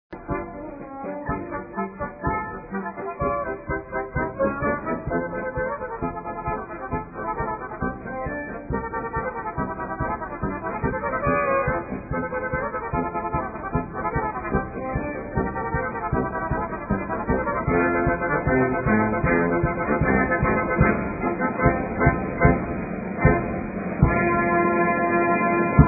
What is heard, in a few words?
POLKA